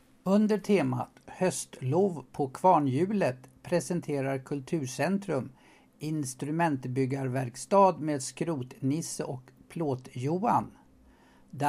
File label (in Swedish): Jingel; Höstlov; Radio Tyresö; Höstlov på Kvarnhjulet; Kulturcentrum Instrumentbyggarverkstad; Cykla bak och fram